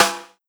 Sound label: Rim Rim Snare